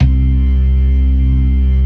ORGAN, LOWER MANUAL

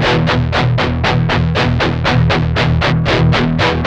8TH F 2, Guitars; Spectrasonics; Hans Zimmer Guitars VOL-1; 128-JACKHAMR

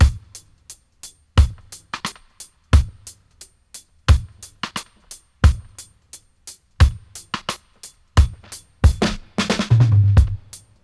88 dry, drum loops, dub; Drumdrops In Dub VOL-1

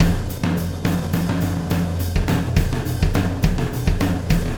Wormhole Beat, Processed Drumkits; Sonic Foundry (Sony Creative Software); Zero Gravity Beats

